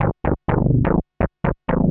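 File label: synth bass, house, 125, A; eJay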